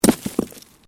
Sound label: Bricks Movement Minor